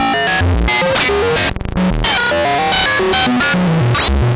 Random, LFO & Noise; Sonic Foundry (Sony Creative Software); Prototechno

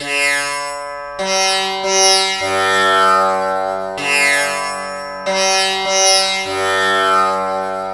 TAMPURA; Spectrasonics; Heart of Asia

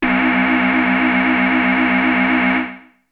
Synth; ZERO G - Datafile DISC 2

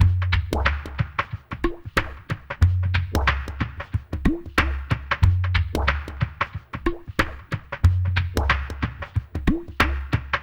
CAVERN, Liquid Grooves; Spectrasonics; Liquid Grooves 1 & 2; CAVERN SW

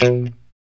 POP B 2, STRAT guitar

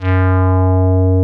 BASSVOX; Masterbits; Vintage Voltage; SQ+FX

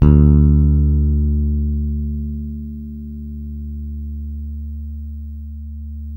Deep bass D 3; Spectrasonics; Bass Legends; DEEP-SIX

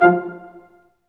BRS, Staccatos, Ensembl stac; Roland L-CD702

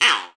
DUCK, Whistle; 90s Sample CDs; Club-50 - Foundations Roland

